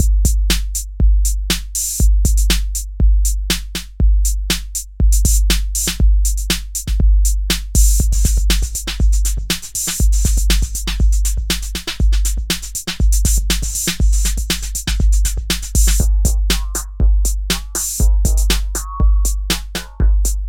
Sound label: beat; fabfilter timeless